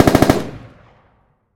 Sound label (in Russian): gulkij zvuk pulemetnih vistrelov